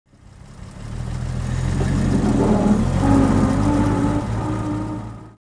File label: IND02TRK, industrial; zones